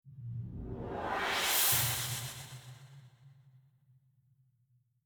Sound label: whoosh; CellScience